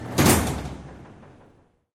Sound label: Door Stop